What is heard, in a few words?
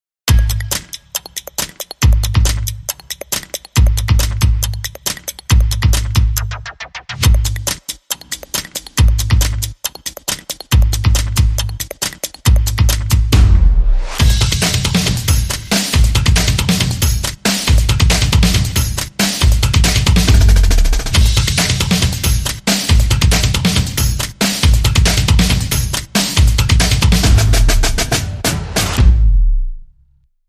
Drum Up The Beat